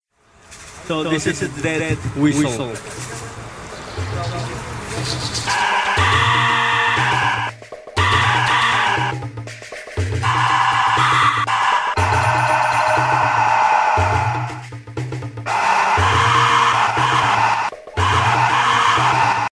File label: death whistle